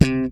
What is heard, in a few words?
SLP POP C, PEDULLA BASS; Masterbits CLIMAX 7 - Bass